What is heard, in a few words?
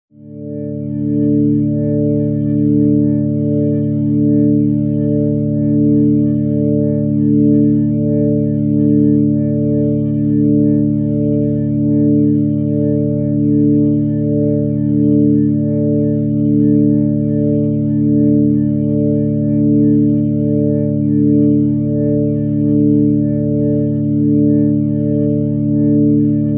organ chord neutral d